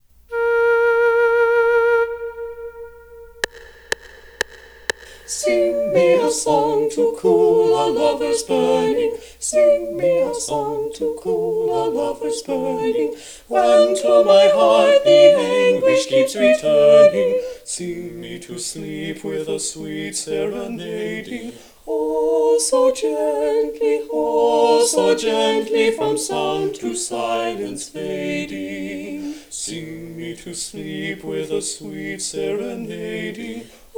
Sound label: missing Alto